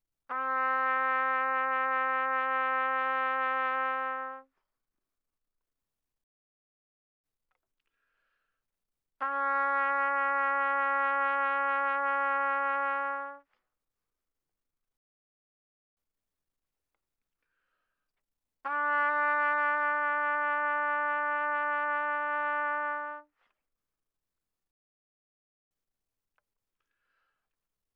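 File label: Trumpet vibrato pp C4 B4; Brass; Bbtrumpet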